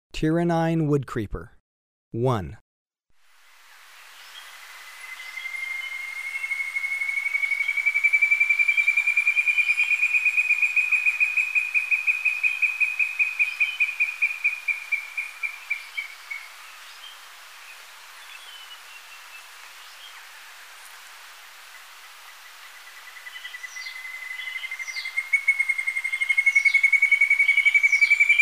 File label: Tyrannine Woodcreeper, Birds of Ecuador; S. America